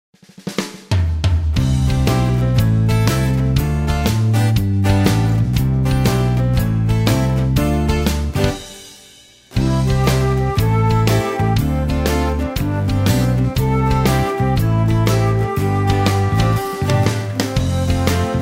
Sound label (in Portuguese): flauta; PENTAGRAMA